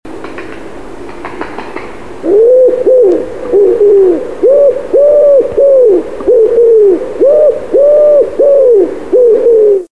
pigeon rame

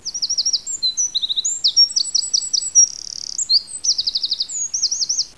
troglodyte, chant

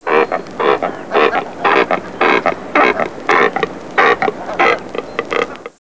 grand cormoran

